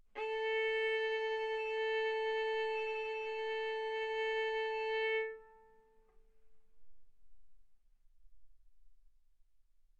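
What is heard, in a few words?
Violin arco mf A string A4 B4 stereo; sul A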